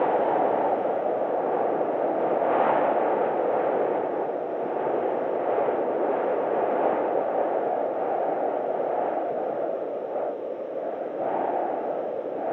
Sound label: Nixies Lake env